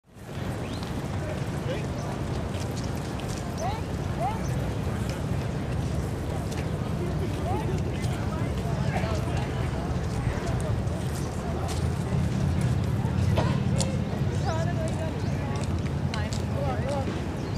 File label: City Park